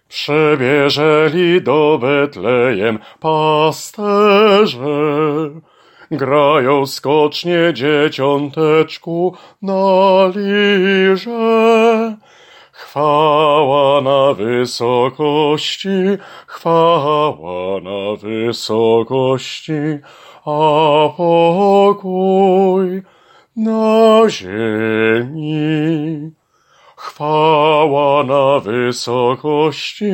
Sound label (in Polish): BAS; Przybiezeli